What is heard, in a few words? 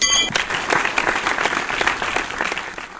point